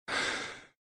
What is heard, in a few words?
Wakeup Breath